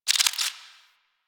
Alchemy Lab Insect; Warframe; Hub; Liset Alchemy Lab

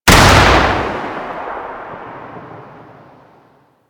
Explosion Short Burst; Warframe

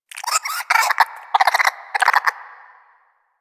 Cryptilex Lure Call; Warframe; Hunting Critters

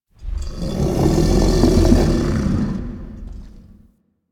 Kraken Call Ambient; Warframe; Warframes; Pirate

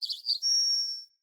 Bird Guide Song B; Animals; Birds; Galene Bird Guide

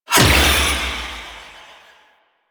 Shackle Impact; Warframe; The New War; Deacons

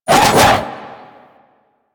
Arch Wing Sword Spin Whoosh; Warframe; Weapons

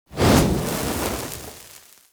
Torch Whoosh; Weapons; Enemy; Torches

